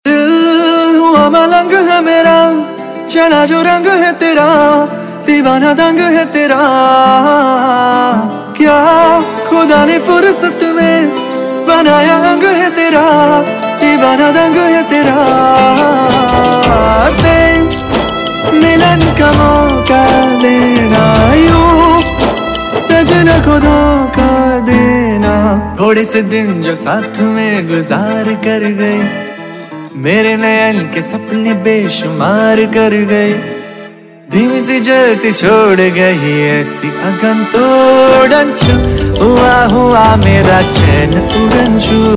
Ringtone, Bollywood; Banjo

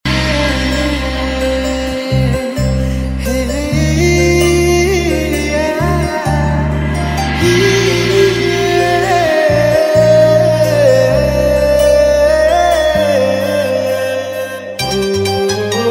BG Tune; TV Serial Tone; Sony

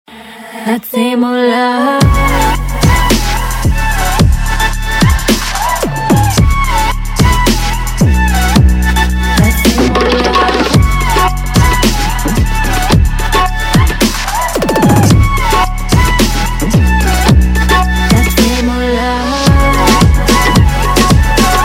EDM Music With Tabla Mix

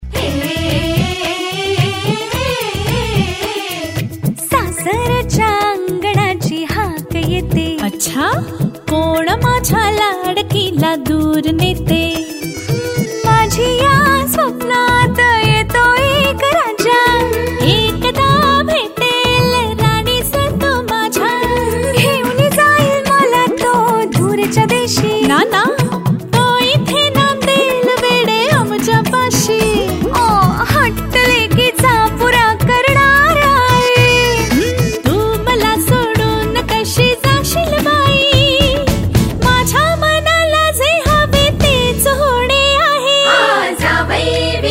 title track; Jawai Vikat Ghene Aahe; Zee Marathi

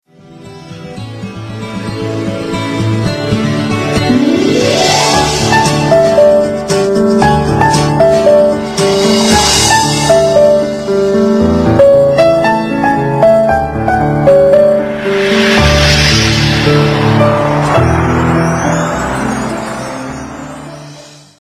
Tune; Tv Serials; Star One

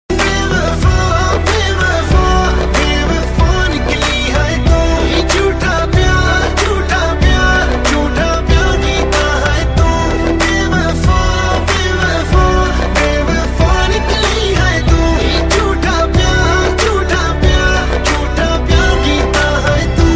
Ringtone, Punjabi

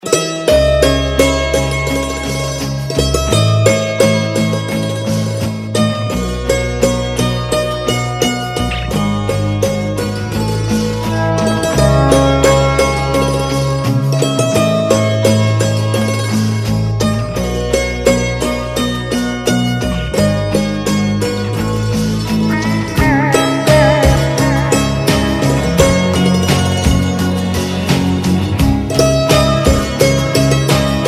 Instrumental Tone

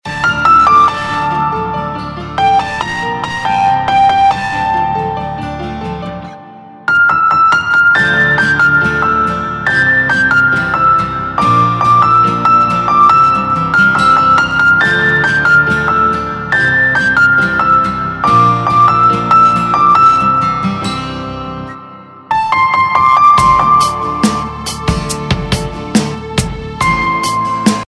Instrumental Ringtone; Bollywood Hits (2015)

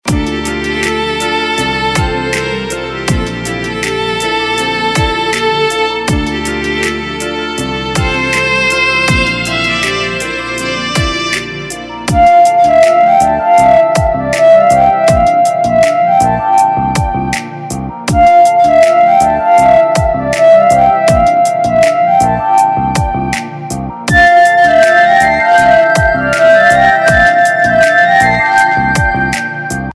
Instrumental Ringtone; Bollywood Hits (2014)

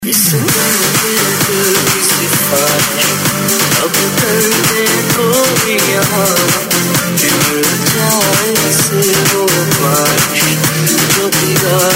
Flute Fusion ReMix; Yaariyan

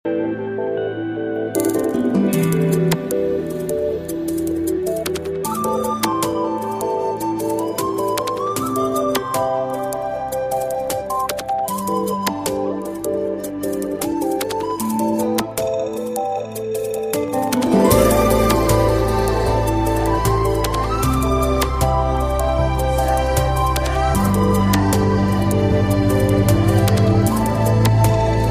Flute, Ringtones

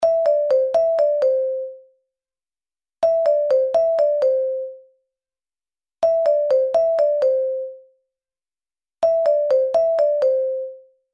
Google Hangout Tone

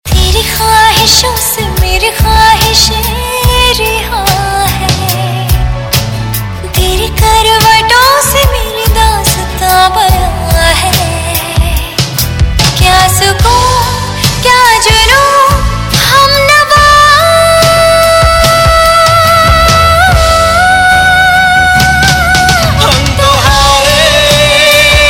Duet; Bollywood; Jism 2

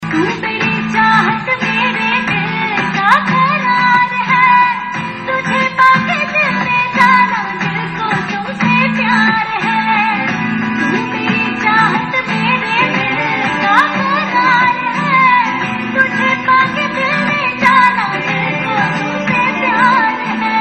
Title Song Female Version; Junoon Aisi Nafrat toh Kaisa Ishq

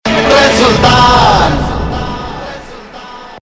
SMS Ringtone; Bollywood; Sultan

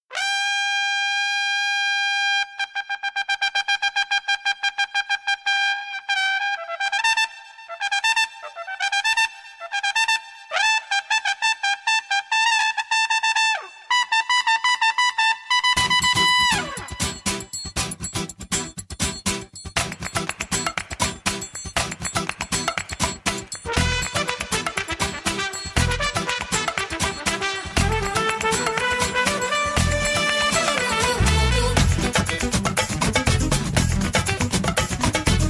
Theme Music Instrumental; Tamil

